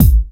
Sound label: rich top end bass drum single hit a key; kick